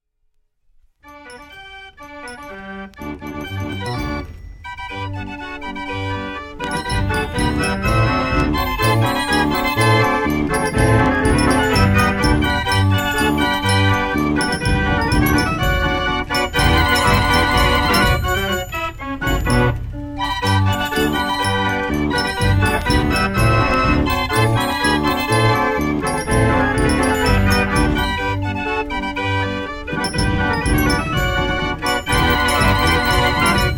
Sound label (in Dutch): draaiorgel